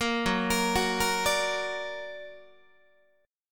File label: F sharp Augmented F sharp Guitar; arpeggiated; 4A,4E,5A,5D,5G#,6C#; JGuitar